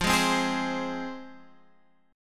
F Minor Guitar Standard, chord; Fm strummed; 13,11,10,13,13,x; JGuitar

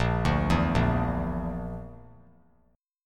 Bb Major Flat 5th, Bb, down; arpeggiated; JGuitar

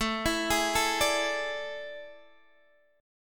A Major 7th Suspended 4th, Guitar, Open D

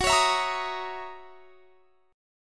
F sharp 13th F sharp down; F#13; strummed

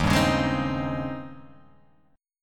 D sharp 13th D sharp

